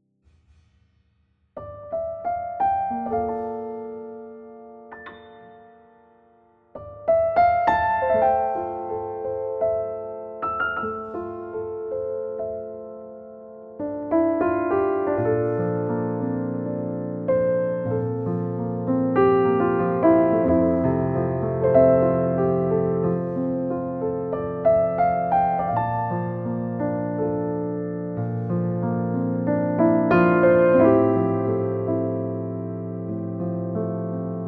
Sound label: Midnight Improv, with the Bechstein DG sweet; Pianoteq; Modartt